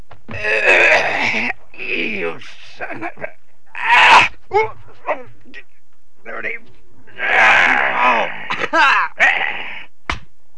wrestle; Sound Effects Library 1.5

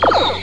photon shot; Asterax

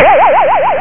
freeze; Theldrow

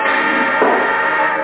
uh oh fanfare; Virtual Computer Pets; cartoonz!

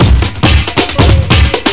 DRUMMER

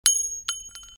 metal

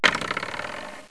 sprong, beeps boings; KABOOM! - Wild and Wacky Sound Software

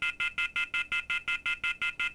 error; TeamAlligator

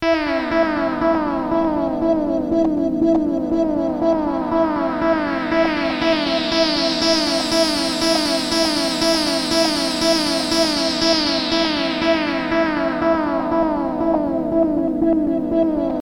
Synt; SYNTH; 120 BPM

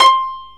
PIZZ; 32,880 sample rate; 0.06 seconds; Audio Visual Research

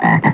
card up; PSL Monthly Shareware CD-ROM (December 1993)

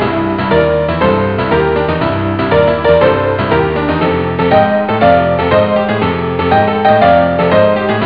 jazz

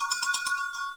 bell ring; Neverhood; 250 Desktop Themes

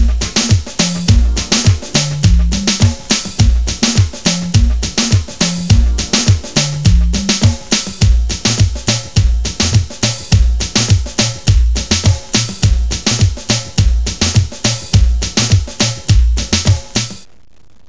drums; Know Your Hockey: The Greatest Player Resource

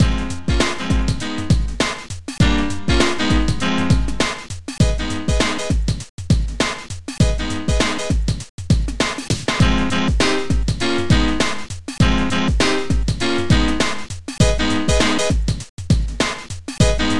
funk, jazz funk; Digital Symphony Collection

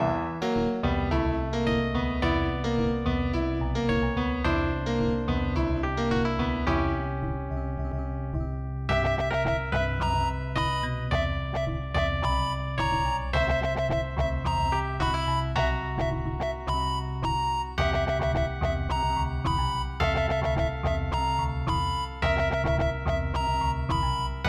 FIGHT; Alone in the Dark Demo

